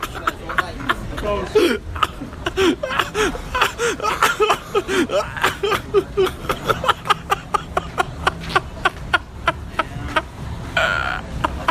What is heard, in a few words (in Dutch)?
lach